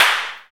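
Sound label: percussion clap; Rhythm Section; Clap & Snap; Clap Modules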